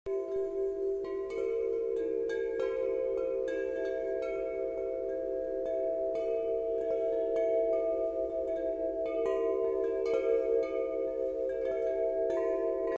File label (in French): son carillon